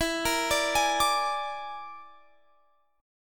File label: Bb 7th Flat 5th E